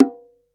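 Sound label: Bongo low; 300 Drum Machines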